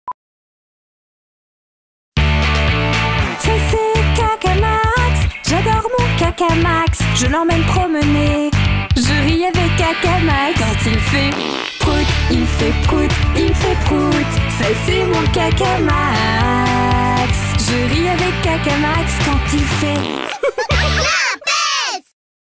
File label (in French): Publicite TV chantee Voix Off femme; Cacamax